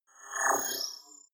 movement creepy robot

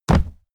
horse step wood

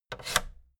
fader plastic scrape double up fast

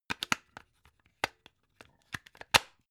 plug plug in clatter long

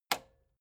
metal button spring push

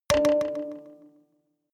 notification kalimba delay body hit

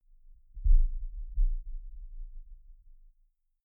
deep sub rumble

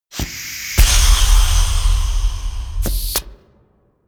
whoosh air

